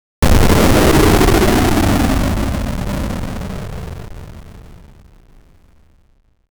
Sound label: a heavy 8 bit hit impact, arcade